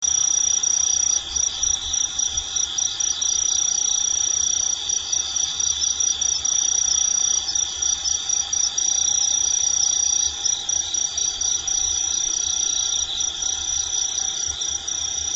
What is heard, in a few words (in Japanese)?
kinhibari; ヤブキリ